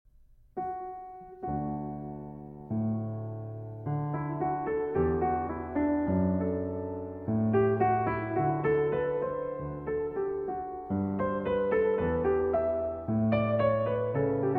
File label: Partita For Keyboard No. 4 in D Major, Allemande; BWV 828 II.